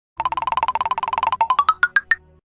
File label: scary bones, silly sound effects; phx new taunts pack 2